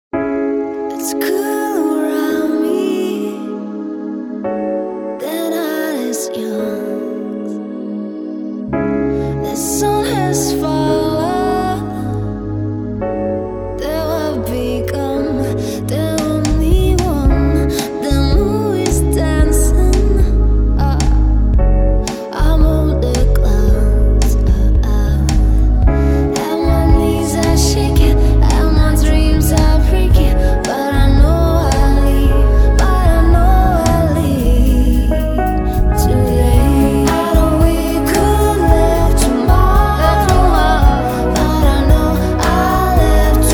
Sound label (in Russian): Отрывок песни